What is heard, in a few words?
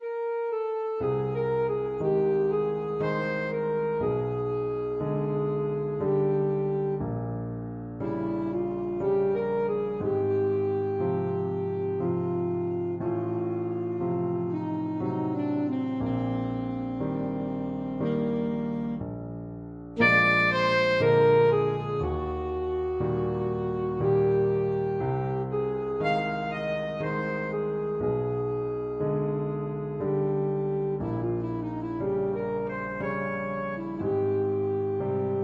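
waltz, alto saxophone; chopin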